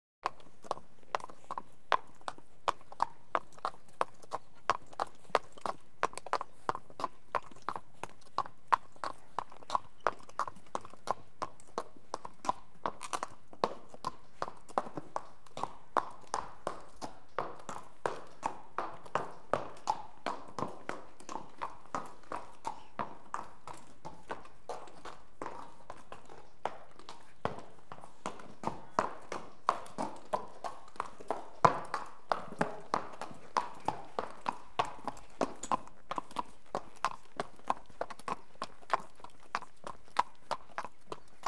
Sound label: Horse Walks On Cobble; Animals and Birds; BBC Sound Effects Library